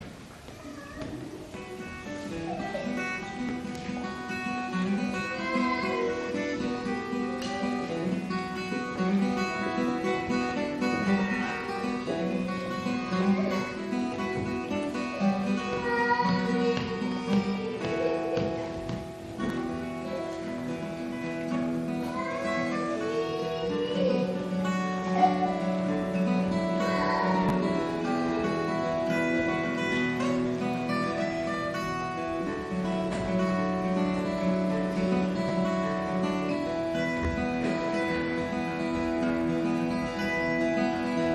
Folk Orch

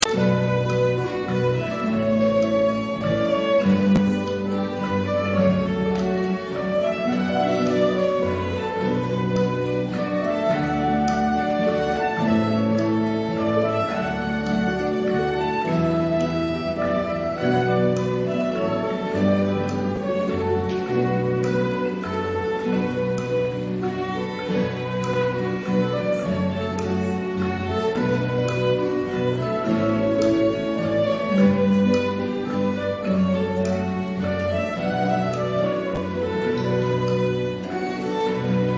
Folk Orch, Far Away

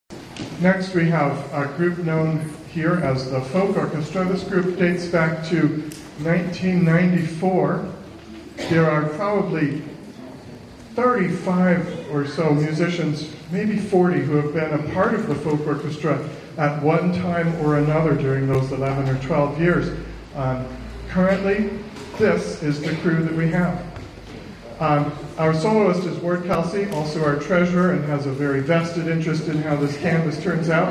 Folk Orch; canvass concert

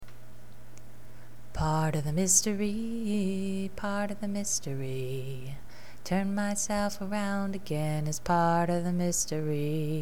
voice 3

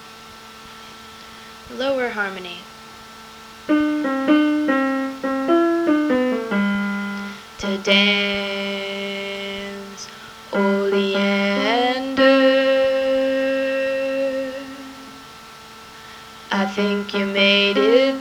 Low Chorus

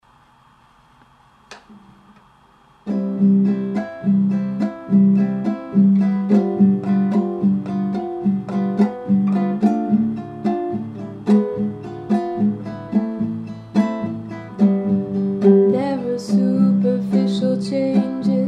main melody

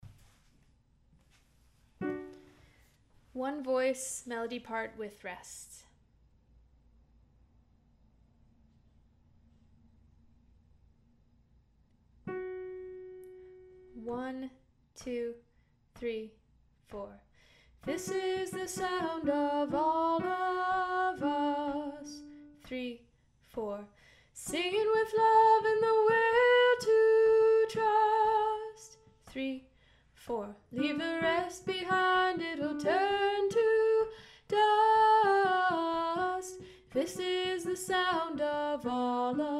one voice - melody

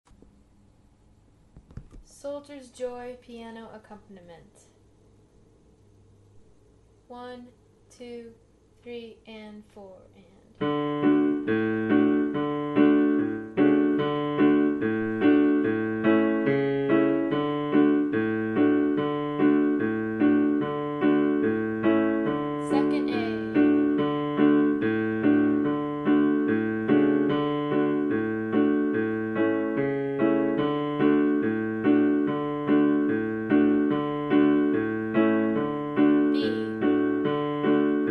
Piano Accompaniment; Soldier's Joy; Practice Guide

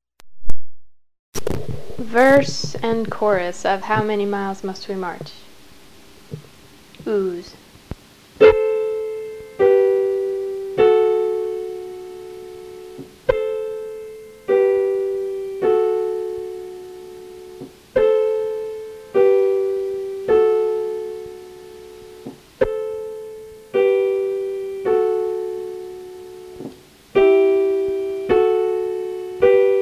Oohs and Chorus

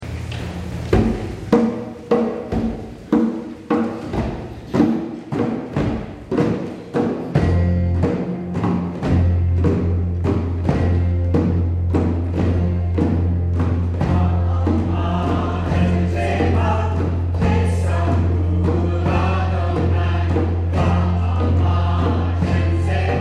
Folk Orch; Va'amartem zevach pesach

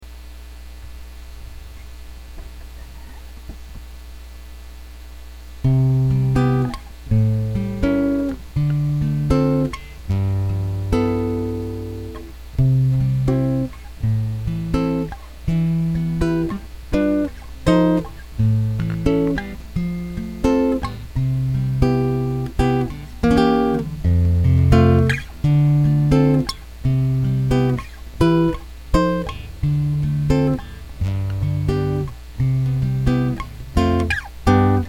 rainbow chords

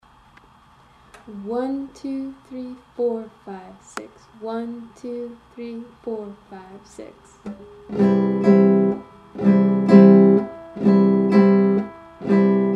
strum

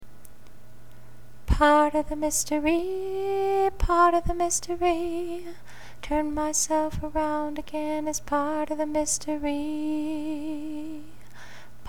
voice 3

